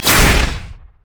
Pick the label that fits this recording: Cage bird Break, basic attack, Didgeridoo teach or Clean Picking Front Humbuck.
basic attack